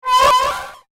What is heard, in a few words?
High Pitched Alien Scream; star wars / trek style games; Andrew Fleming